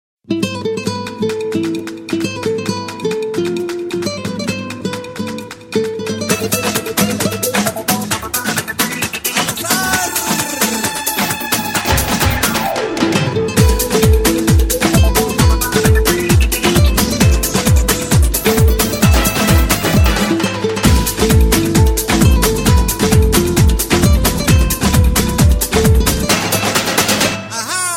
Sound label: Ringtone